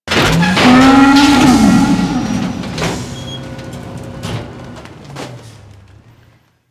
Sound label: Dropship Door Open; Warframe; Vehicles; Grineer Drop Ship